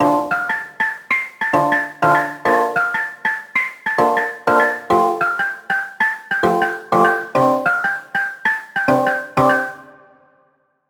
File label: Hybrid Advanced Dancehall Afrobeats; midi wizard 2; Unison